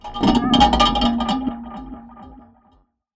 Bass Synth & Delay, contact mic, Tin Of Guitar Strings; musicradar; contact mic madness